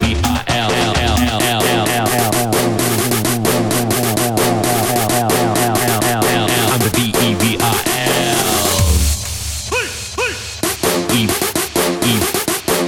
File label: 130 BPM